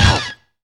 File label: SCREECH STAB; Zero-G; Funky Elements